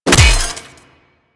Melee Hammer Impact Robotic Flesh

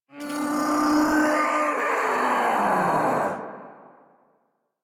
Attack Roar, Boss; Sargas Ruk

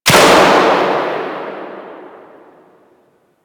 Light Clunk Small; Warframe; Grineer Ocean

